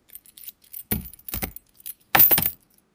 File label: picking key opening chest